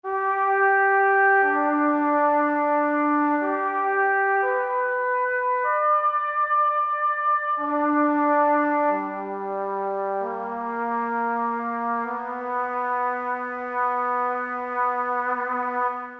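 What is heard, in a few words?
Trumpet & Shakuhachi; Best Service Gigapack; BRA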